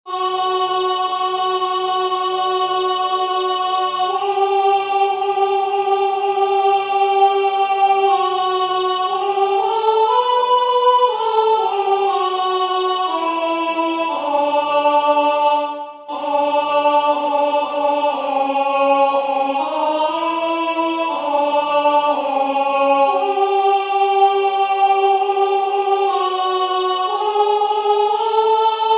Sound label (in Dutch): Alt, koor